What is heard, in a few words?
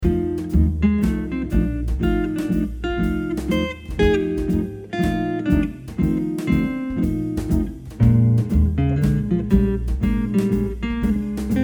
Blues in F Jazz